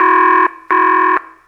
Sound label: DRMSND, Underground Hip Hop, BEATKIT; USB Soundscan vol.02; AKAI